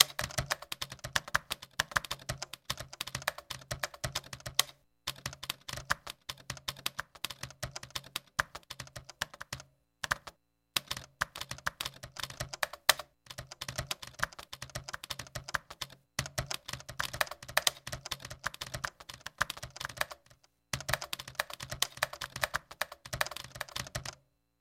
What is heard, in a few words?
Typing, 45 wpm Looped Computer